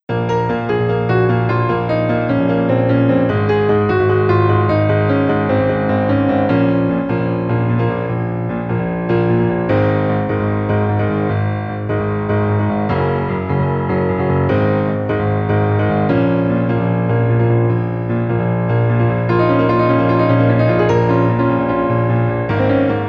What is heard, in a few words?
bgm, piano; Famicom Wars